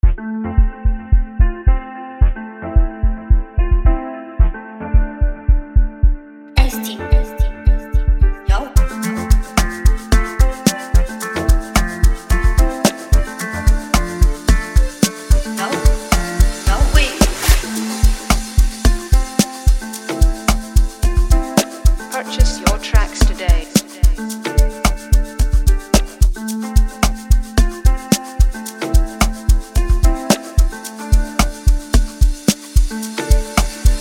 FREEBEAT

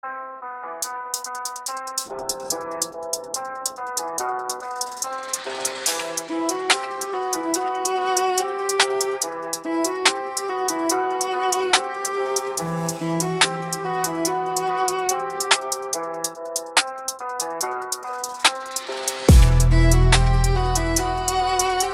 drill beat

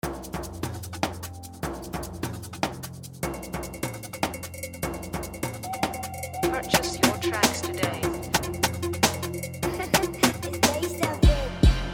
150bpm, type beat

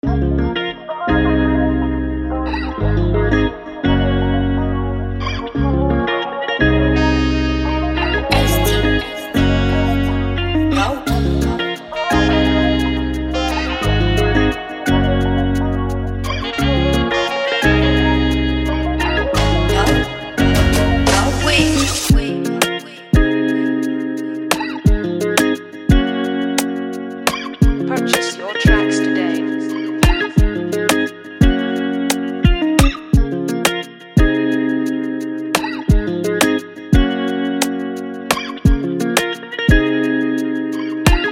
FREEBEAT

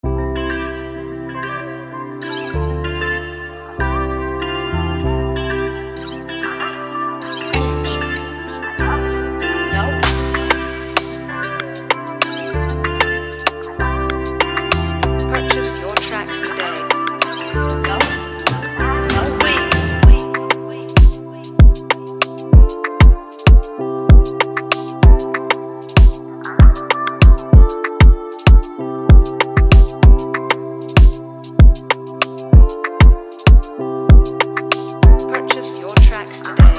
FREEBEAT

